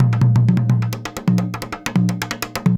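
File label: junjunba groove; Best Service; World Colours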